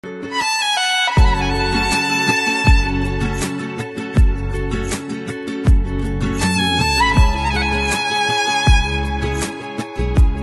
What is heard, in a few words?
Violin